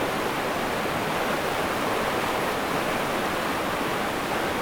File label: river; Golden Crayon Sounds